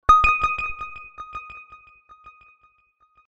Ping Email, Digi Delay; HTC

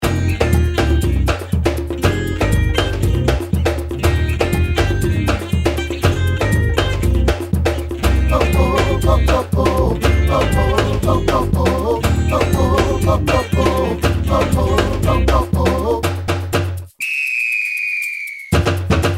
Axe Groove; LG; X350

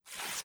screen Rotate; ZTE E850; keypad

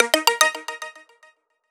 Press Alert; Sony Ericsson; C901; PlusFourSix; Lars Edvall

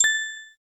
doremi; SKY IM-A850S VEGA R3; keytone

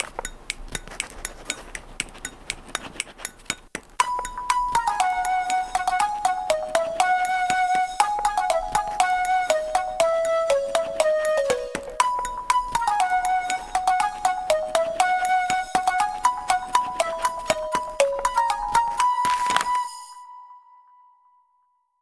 Paper Flute, alarms; Samsung SM-W2015 Golden2

